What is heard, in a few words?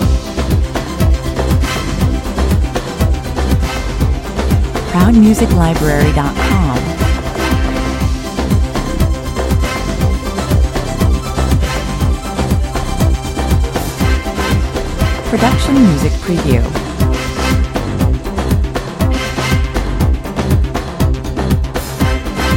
goa techno loop